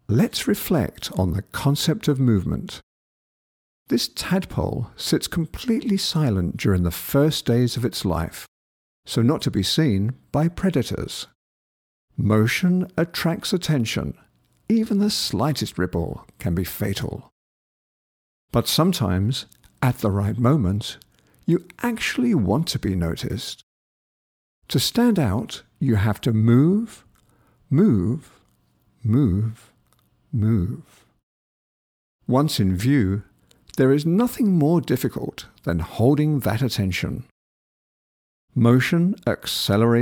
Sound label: kicks